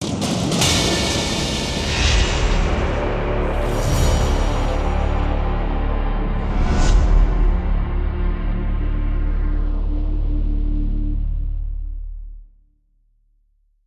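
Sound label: Stinger Miniboss Dies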